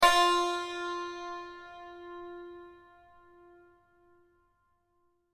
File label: santor fa